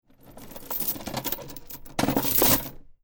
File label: Heavy Chain Rattling Drop Down; Metal Sound Effects; LYN104 package of Chain sounds; Lynne Music